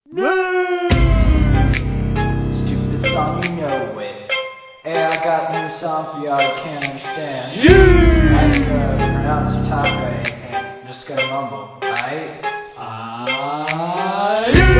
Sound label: Digital Laughter Inaudible Rap